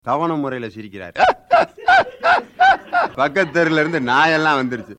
Instalment Laugh

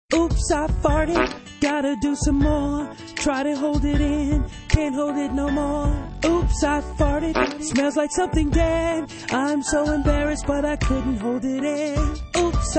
Farted

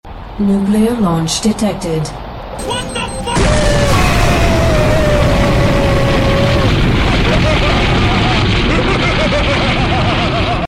Nuke; Sc2 Terran

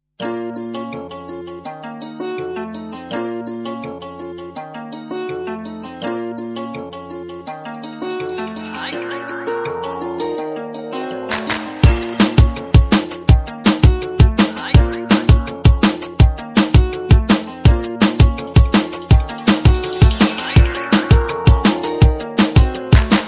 Drum Bass

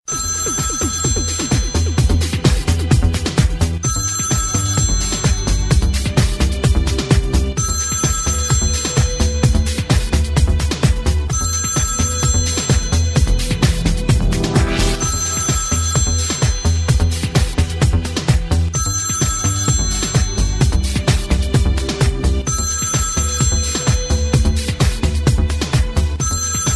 Ring-ring

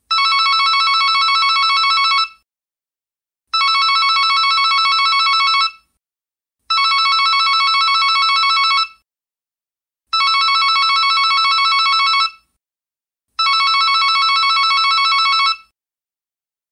Digital Ringtone

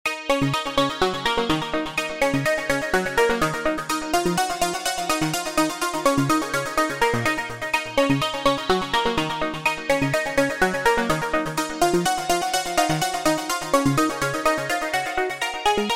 Melodic Trance

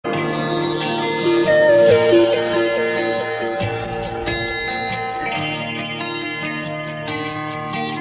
Flute Music Msg

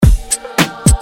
Funky Groove

